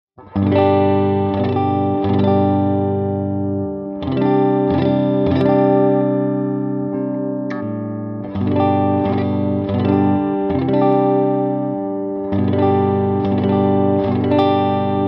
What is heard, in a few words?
neck clean single, pickups